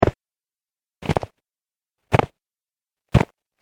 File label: Snow Foot Step Collection; Footstep Sound Effects